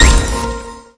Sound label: lucky Reel Three Jewel; jewel fruits